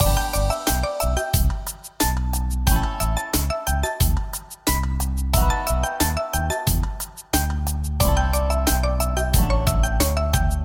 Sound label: Melody Theme Loop